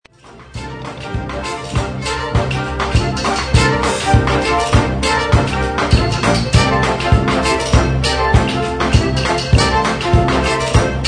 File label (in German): toene